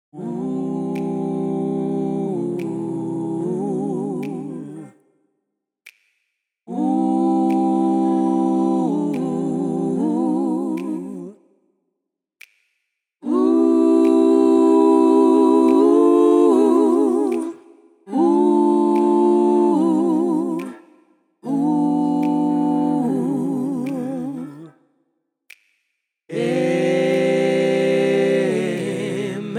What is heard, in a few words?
Amen acappella, gospel